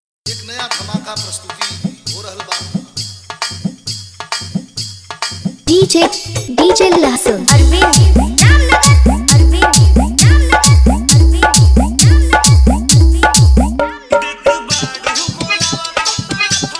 Bass Mix

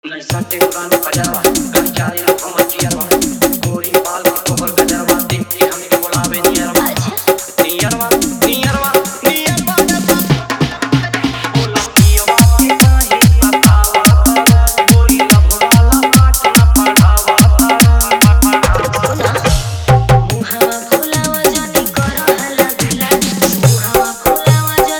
Fully Dhollki Bass Dance Mix

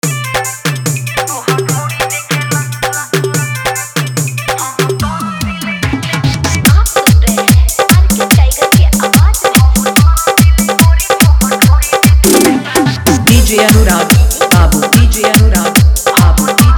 Dj Song Hard Dhollki Bass Mix

